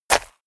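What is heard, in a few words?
step walk dirt, footsteps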